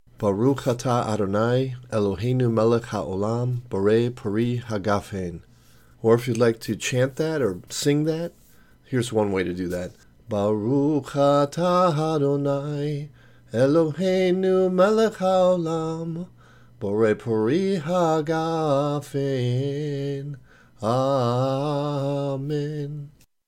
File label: borei pri messianic chant; Blessing Cards